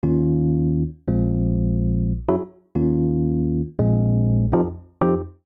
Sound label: Bb Minor 88 bpm; MIDI Clips; Tonal; Accompaniment; Rcrd Plnt; Ableton; Factory Packs; Chop and Swing